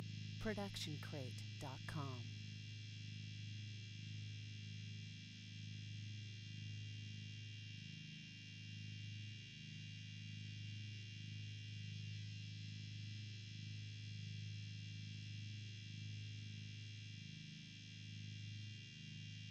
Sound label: neon light buzz; soundscrate